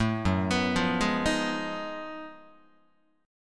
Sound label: F sharp Minor Major 13th F sharp down Guitar; arpeggiated